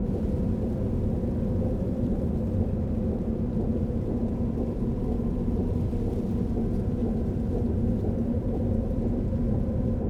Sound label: ambience